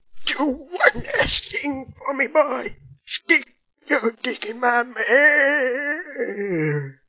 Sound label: die; sven; npcs; dr douchebag